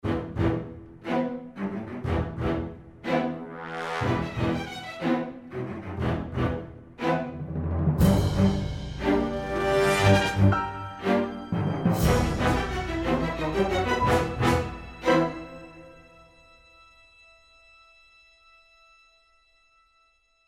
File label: Music; Age of Empires III; cinematics